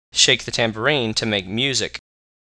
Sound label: tambourine